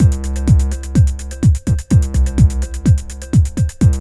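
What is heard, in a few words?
drum loop; X-Static Goldmine 3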